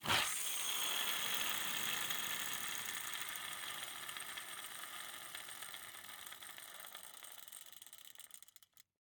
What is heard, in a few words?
Rollerskates, old fashioned, all metal, wooden wheels, swipe, spinning, slow to stop, shifting; Eiravaein Works; Vaeyan IV